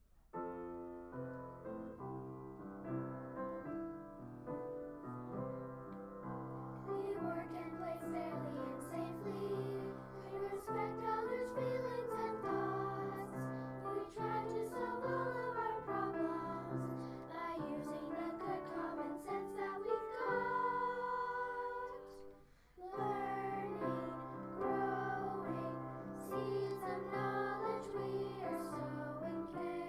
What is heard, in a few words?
school song